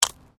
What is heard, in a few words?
Digging Small Handheld Tool Rocks